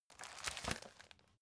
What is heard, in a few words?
folder file jacket turn short